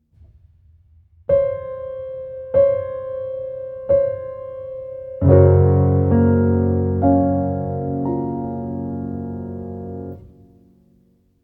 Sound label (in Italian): intervallo di seconda minore